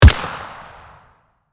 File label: HK416 assault rifle single shot